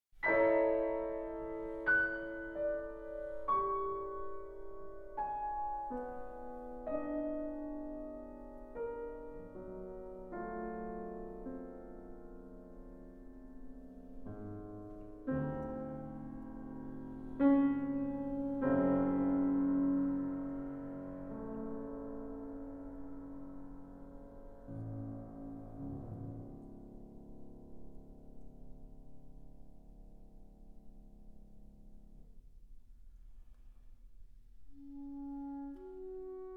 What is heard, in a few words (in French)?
Musique pour vents, Nocturne; Hetu